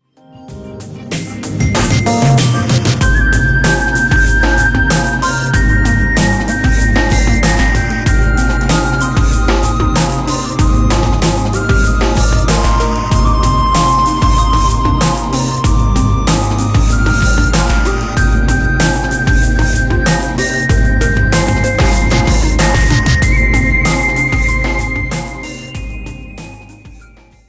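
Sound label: background music crossfade; Invaxion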